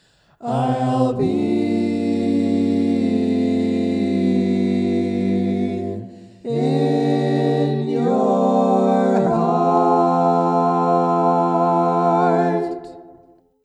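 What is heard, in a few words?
baritone, barbershop tags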